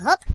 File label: Jump; hedgewars; Default uk